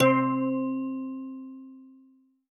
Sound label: Bells; Space Jamz